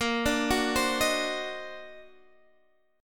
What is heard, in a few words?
D sharp Minor Major 13th A sharp